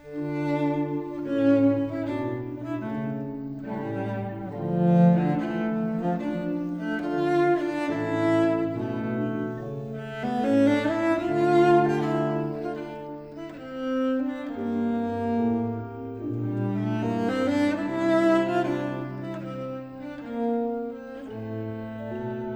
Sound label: Concerto F-dur, Largo, violoncello; RV 411; Antonio Vivaldi (1678 - 1741)